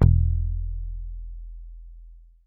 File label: YAM MUTE E 1, Bass; Masterbits CLIMAX 7; PATITUCCI BS